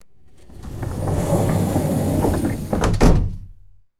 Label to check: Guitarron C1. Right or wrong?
Wrong